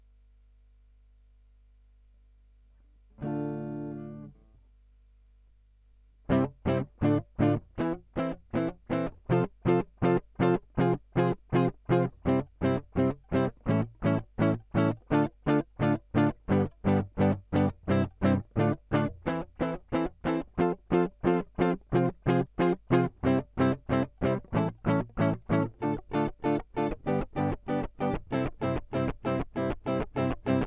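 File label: Guitar Line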